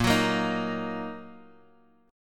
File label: Bb Suspended 4th Sharp 5th, Bb, down, Guitar, Half Step Down; Bbsus4#5 strummed; JGuitar